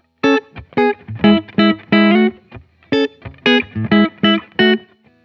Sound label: Electric Melody Loop 180 BPM F# Min; Cymatics